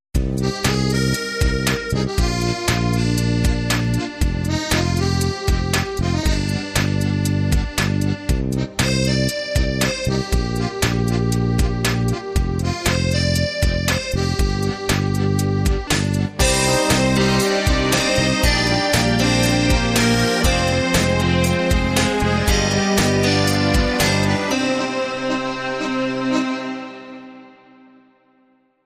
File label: Accordion Song